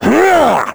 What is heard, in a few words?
dorian hammer; Crypt of the NecroDancer